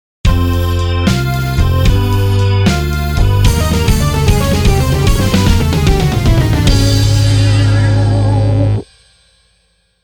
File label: JINGLE GAME OVER